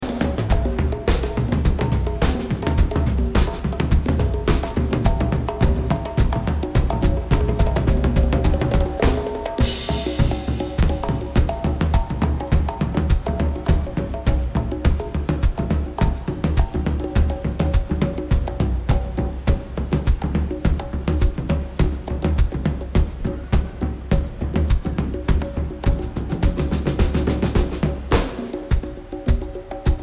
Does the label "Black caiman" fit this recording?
No